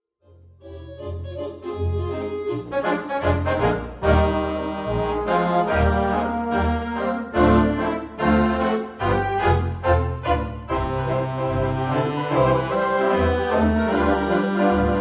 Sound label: Alternate Ending, theatre organ